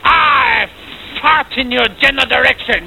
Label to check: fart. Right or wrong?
Right